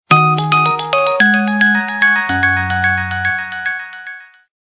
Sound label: chillout drop; LG KE770 Shine